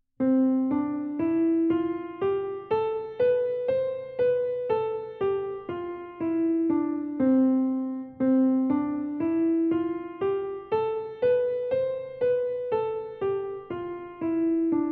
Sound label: C Major Exercise